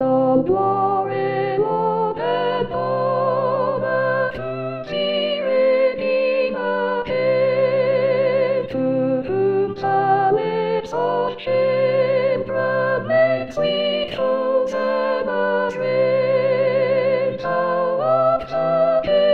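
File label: All Glory Soprano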